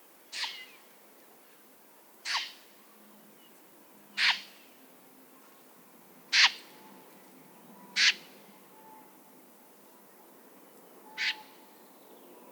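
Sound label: common snipe gallinago, flight call; whbruch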